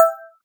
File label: prompt single low; IQ.Pilot; selfdrive